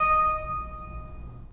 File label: 3D Pipe Mix Celeste, 3D Organs